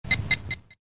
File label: Fast Beeps